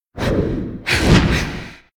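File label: butterfly attack; monster; Senillinea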